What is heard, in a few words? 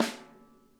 snare, drum kits